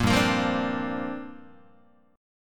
G Minor 11th G down Guitar; Gm11 strummed; JGuitar

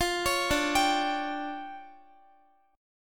D Minor Major 11th, down, Guitar; DmM11 arpeggiated; JGuitar

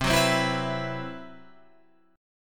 C Major 7th Flat 5th C

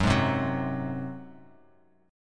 Gb Suspended 2nd Flat 5th Bass Standard; Gbsus2b5 strummed; 14,11,10,11

